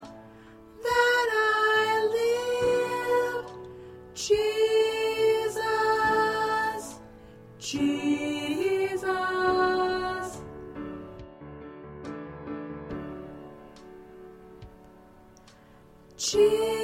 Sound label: Soprano